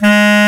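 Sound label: Bass Clar mf G#2; Digital Sound Factory Virtuoso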